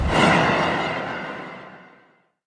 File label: poisoned effect start